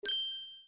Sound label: crystal